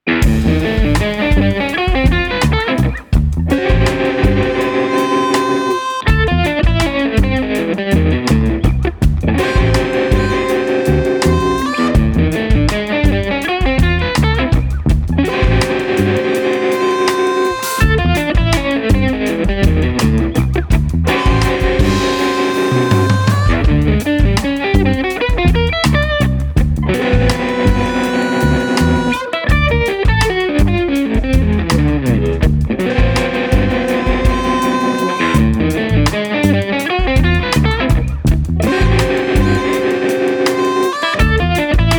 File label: tritones